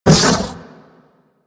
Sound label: Flame Laser Stop; Warframe; Corpus; Corpus Railjack; Spec Ops Fire Moa